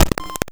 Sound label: grind, EMU XL-7